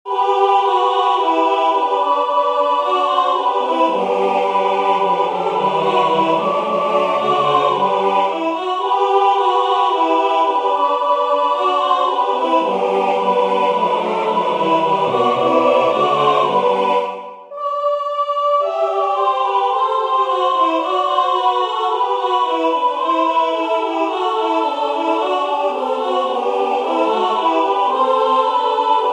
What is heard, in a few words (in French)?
Les anges dans nos campagnes Tutti